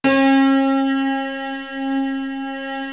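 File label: JD synth piano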